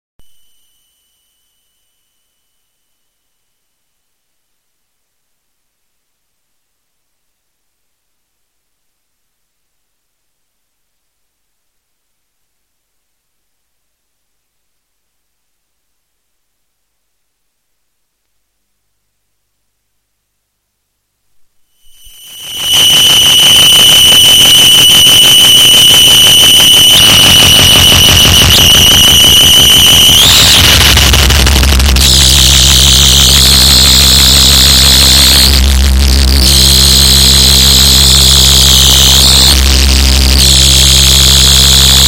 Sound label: Morning Noise Broadcast